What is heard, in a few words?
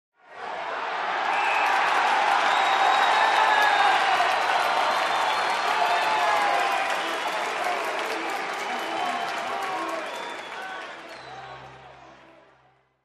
Sports Game Crowd Applauding